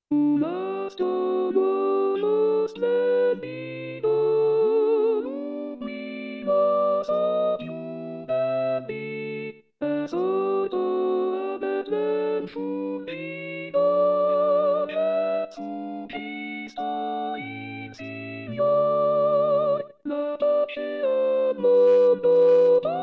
Soprani; natale

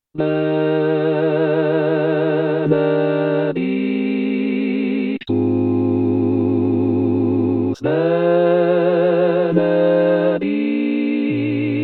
coro; Benedictus Perosi; Pasqua

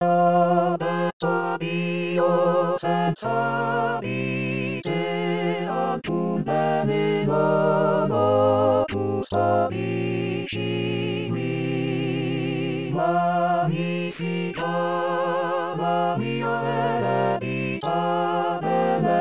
Coro

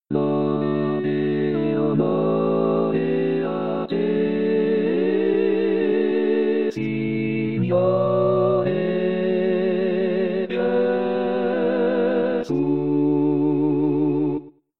Coro; Pasqua

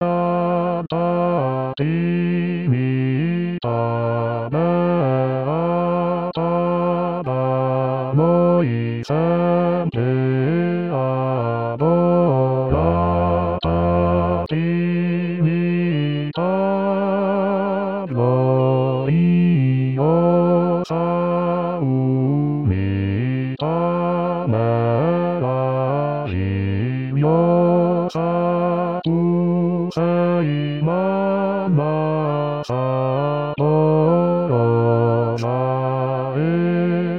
Alta Trinita Beata Bassi; anno liturgico

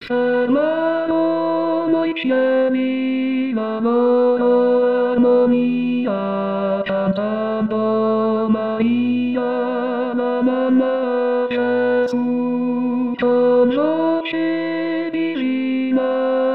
Tenori; Fermarono i Cieli; S Alfonso; natale

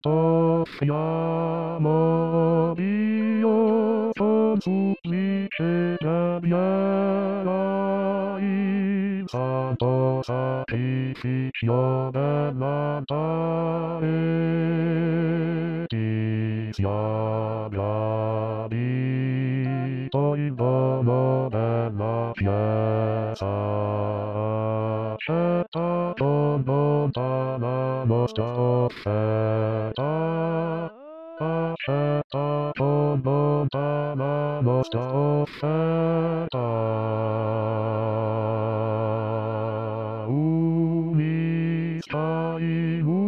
Bassi; Ti Offriamo Dio

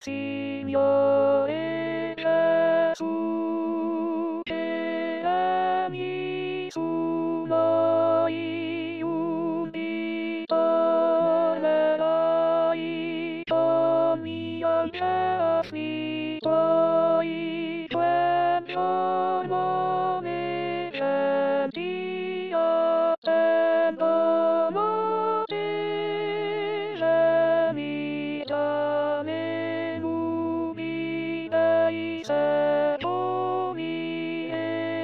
Signore Gesu Contralti

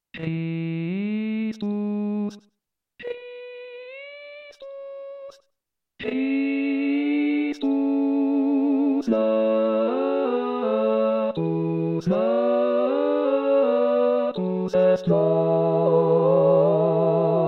Coro; Christus Natus Est; natale

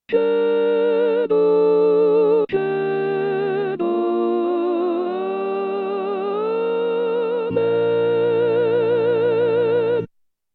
Credo Soprani; anno liturgico

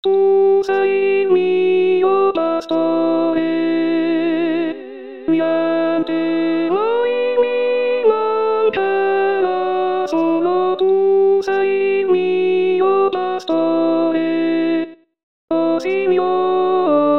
Contralti II; anno liturgico

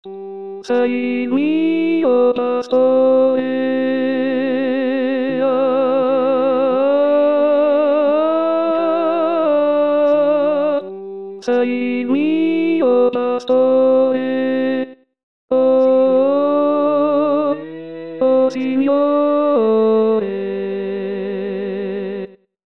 Tenori; anno liturgico

